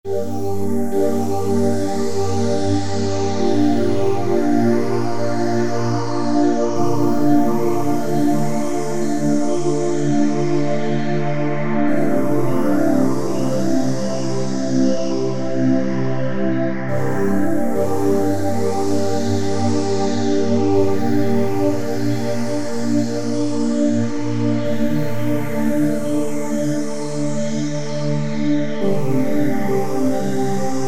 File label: synth textures, Breath; Skye Breath; Apple GarageBand 2